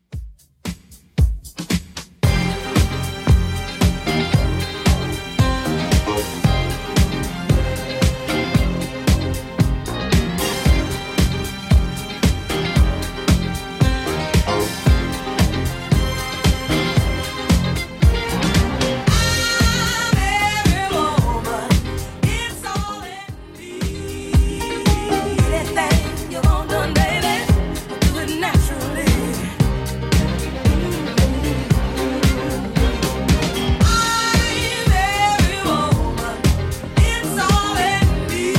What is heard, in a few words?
70s Redrum 114bpm